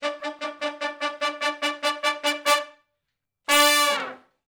Shuffle Blues Triplet Riff (D) unison, Horncraft for R&B Sections; Sonic Foundry; Sony Creative Software